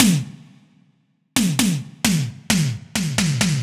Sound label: Tom